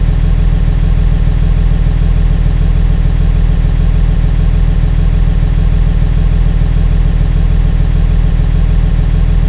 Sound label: truck; saw